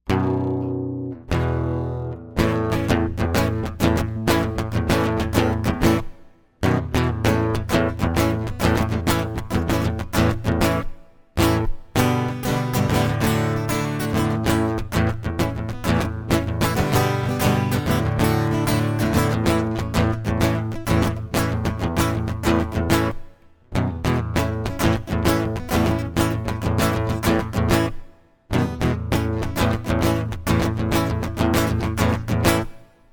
Reverberation acoustic

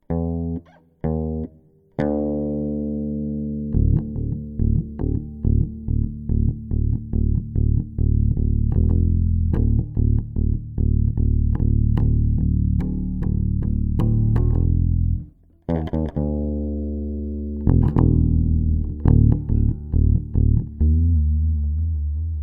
Low bass

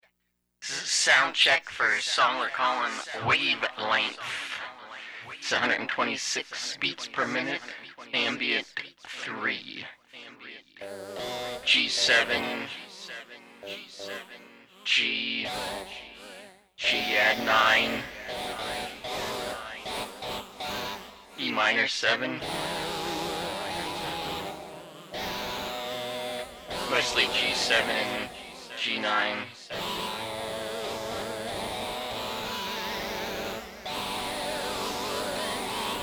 Soundcheck

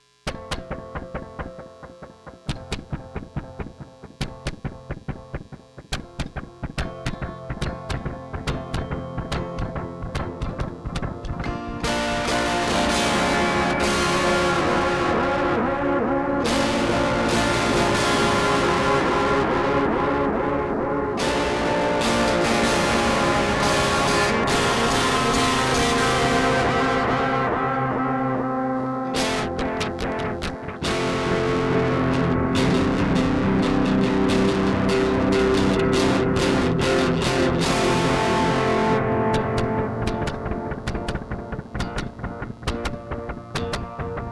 electric

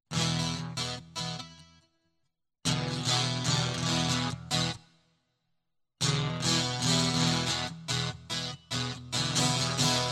Guitar

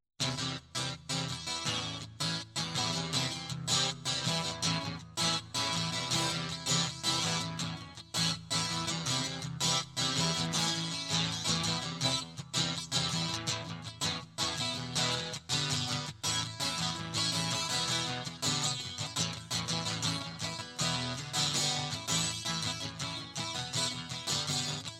Guitar Loop

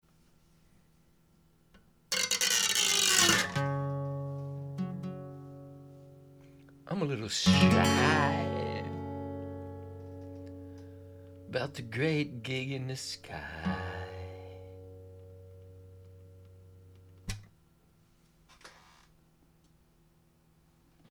Prelude

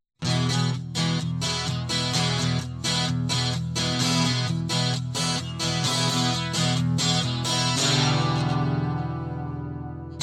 Guitar

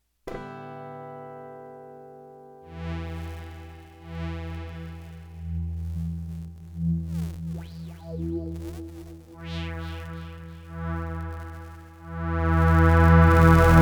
space intro

